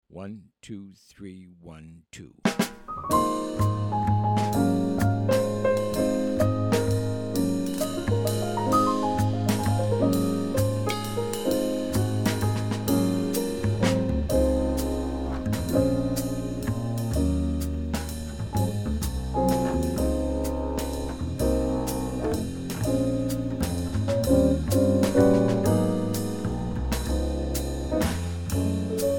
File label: minus melody